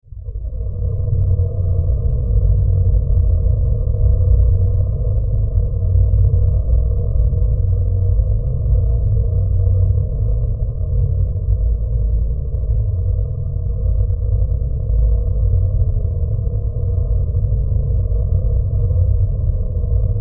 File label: Science Fiction Spaceship Interior Hum; Spacecrafts